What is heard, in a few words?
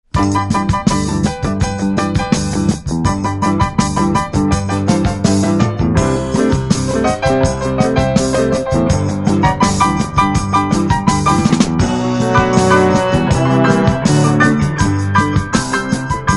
Hammond